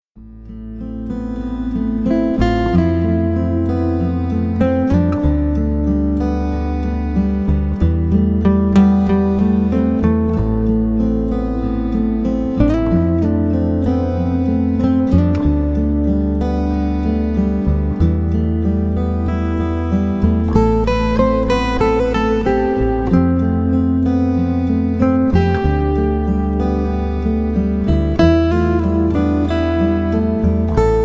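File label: guitar music, magical music for the soul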